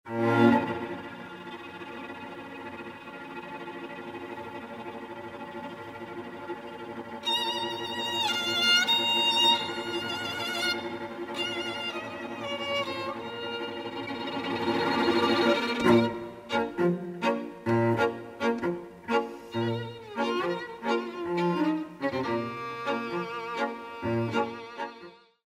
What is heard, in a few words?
Last balcan tango, String Quartet